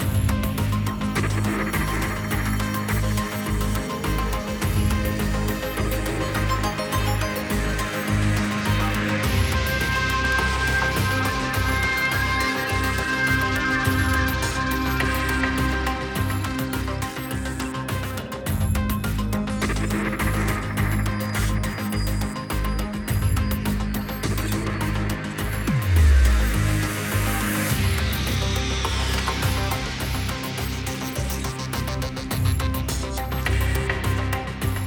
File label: Loading Loop; F1 2015